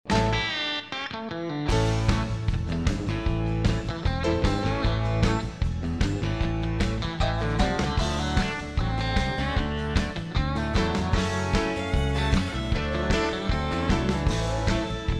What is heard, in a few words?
country